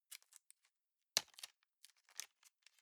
plastic bag small clasp open

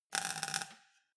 weld sparkle short

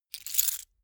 key ring large place

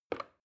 plastic button start release regular

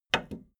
video tape player plastic stop click slow

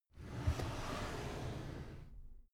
drawer cabinet carton open soft

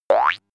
boing special

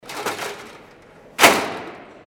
bottle case noise

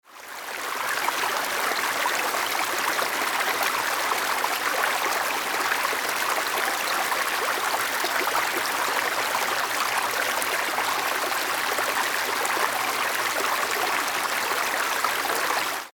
stream small

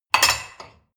dishwasher cup place

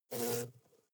hornet hum

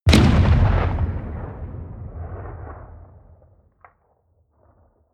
artillery heavy distant

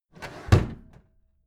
packed drawer smooth clatter close heavy halfway

